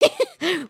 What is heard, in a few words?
stink